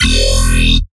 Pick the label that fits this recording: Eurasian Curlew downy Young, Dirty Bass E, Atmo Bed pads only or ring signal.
Dirty Bass E